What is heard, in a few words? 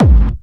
hard bass drumz